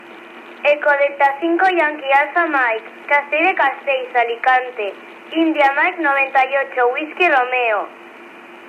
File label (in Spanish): Baliza UHF; ED5YAM